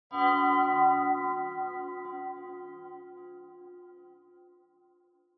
Horror Accent Mid Long

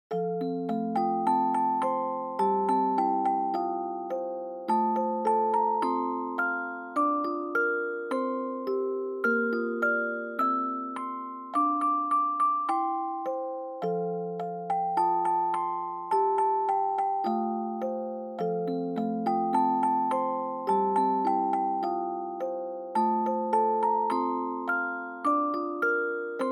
Dry Fast

